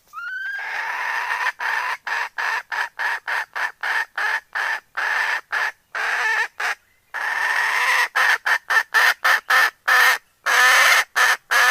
Tieng ga mai rung cuc tac goi trong

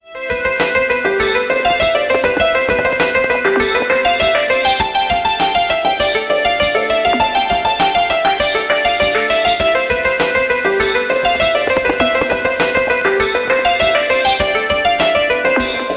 khong loi xiaomi redmi 13c

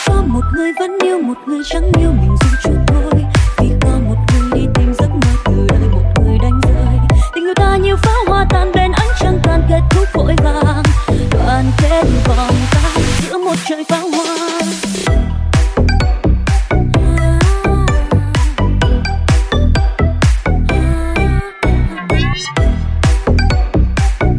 Nhac Chuong Remix

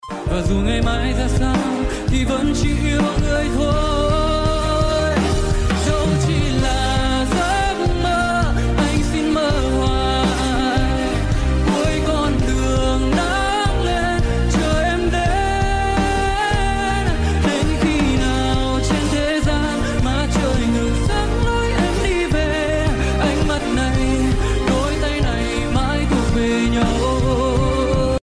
nhac chuong Cover

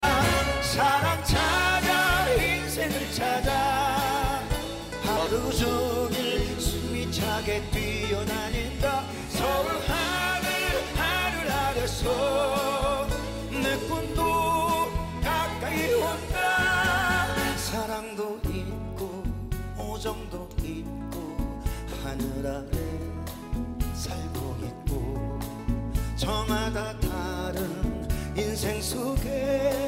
nhạc chuông nhạc phim Hàn Quốc

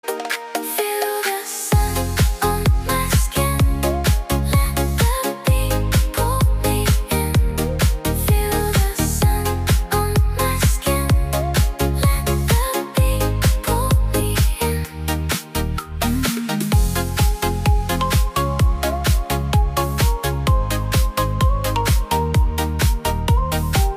giai dieu edm cuon hut

